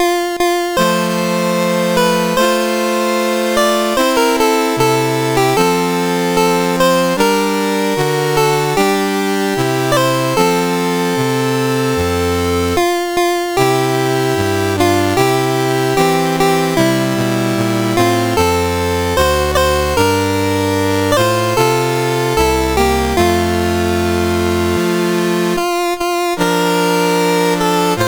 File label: CSG8580R5, National Anthems; Maldives Republic